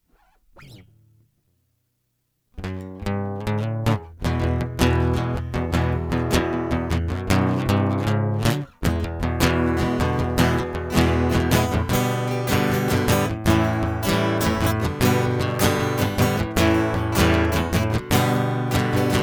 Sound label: Guitar Instrumental